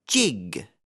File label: jig; Old Mother Hubbard